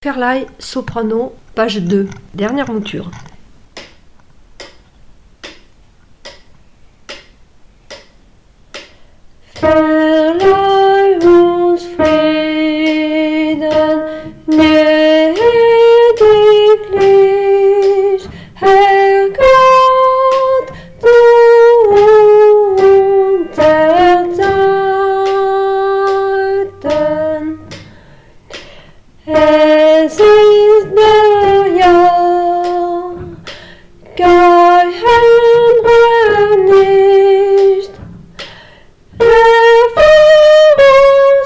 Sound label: Soprano; Verleih; Mendelssohn